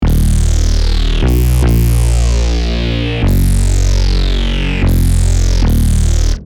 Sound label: defender 150bpm; vitality vol 10